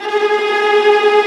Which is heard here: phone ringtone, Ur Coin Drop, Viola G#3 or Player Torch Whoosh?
Viola G#3